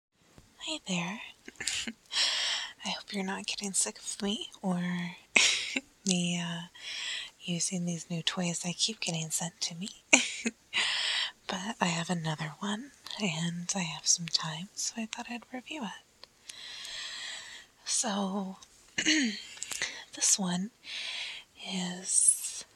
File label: toy, real orgasms, trying to be quiet, squirting, wet noises; F4M; Late Night Review; giggles; needy dirty talk